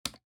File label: key